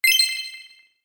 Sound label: Ui Confirmation Alert